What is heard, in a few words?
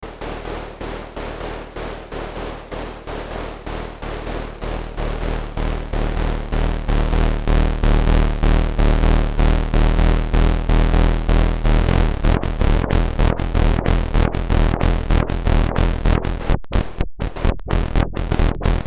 BASS NOISE